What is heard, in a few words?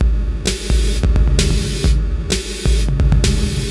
Beat (130BPM), Gated Reverb; musicradar